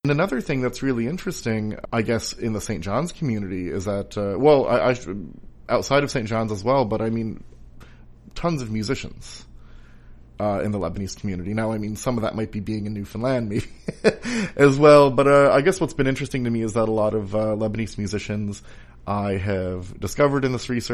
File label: Music